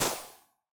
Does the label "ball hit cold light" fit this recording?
Yes